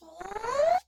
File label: poise, rat; midnight guns